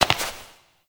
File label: Step Rock A; Warframe; Koumei